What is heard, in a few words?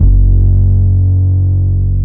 BASS, F#1; Zero-G; Chemical Beats